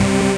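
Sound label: synth tech; Best Service Gigapack 1; SYN 02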